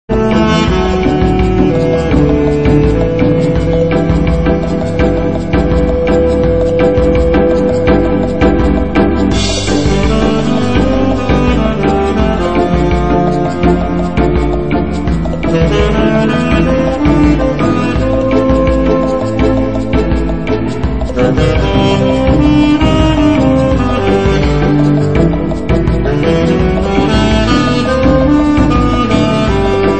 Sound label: tenor sax; wizball